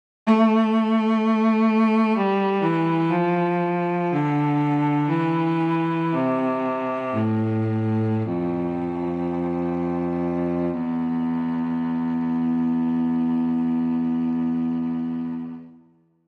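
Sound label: VCELO solo legato, cello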